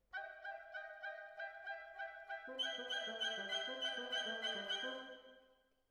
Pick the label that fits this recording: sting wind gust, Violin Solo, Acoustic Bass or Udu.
Violin Solo